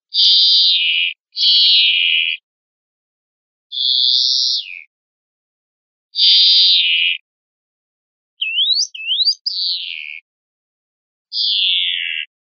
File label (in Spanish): faltas rascada, verderon